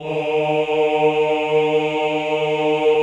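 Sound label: choir E flat 2, men; Sound & Vision - Gigapack I CD 2 (Roland); Men-Combi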